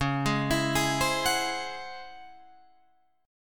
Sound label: Db Minor Major 11th, Guitar, Drop D; arpeggiated